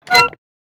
damage vehicle; World Of Warships

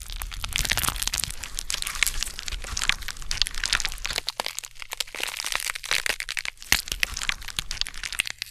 ambient port; World Of Warships; port3d

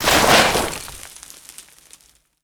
foot dirt slide end; footsteps

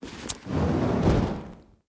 torch ignite; Rust

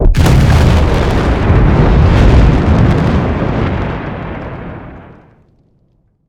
damage vehicle; World Of Warships

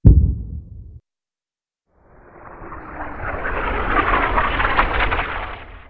Artillery; Red Orchestra Ostfront 41-45

